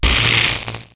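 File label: electric spark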